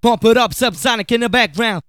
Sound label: VOX SUNG; Megabass Remix! 2